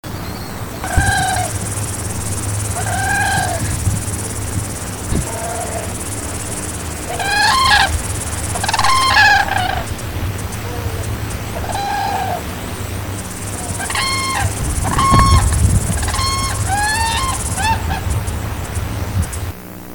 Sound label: whooping crane